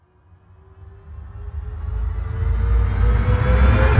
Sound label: Reverse Fx; musicradar